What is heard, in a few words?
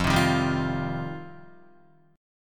F 7th Suspended 2nd Guitar, chord; F7sus2; strummed; JGuitar